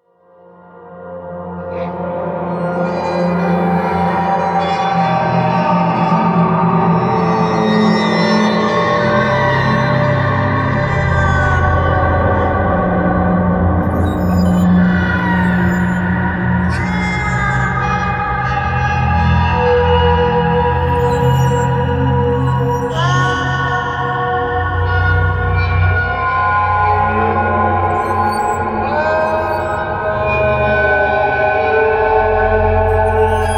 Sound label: dark atmosphere background